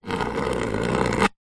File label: household knife scrape plastic chopping board